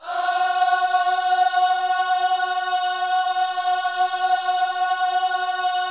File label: OHS F#4, Gospel; Spectrasonics; Vocal Planet Vol.1 Gospel; akai